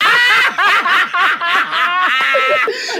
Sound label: laughter group; ooh ahh